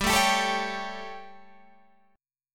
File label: G Suspended 2nd Flat 5th G Guitar Open G